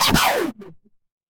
retro game laser

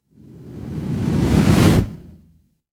fire electric whoosh flame

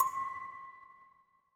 instrument singing bowl

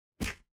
impact punch

